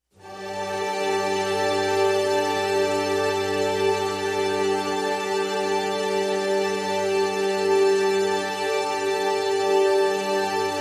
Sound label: Collective Strings G